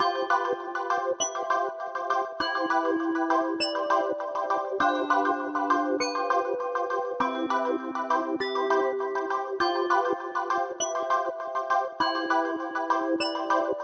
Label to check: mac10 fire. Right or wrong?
Wrong